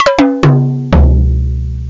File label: Bongo Tom; Amiga-CD 1996 #5-6; sampler; Instruments; Amiga Magazin; Amiga 8-bit Sampled Voice